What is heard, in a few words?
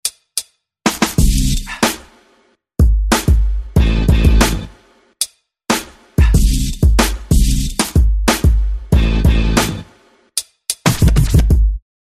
groovy hiphop short